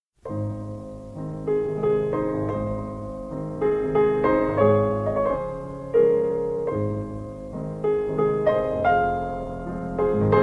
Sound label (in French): valse en la majeur; j. brahms